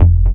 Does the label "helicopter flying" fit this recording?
No